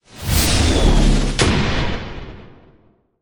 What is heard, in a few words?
magic missile, spells; 1st level spells